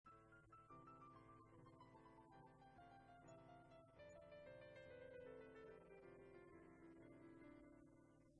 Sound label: piano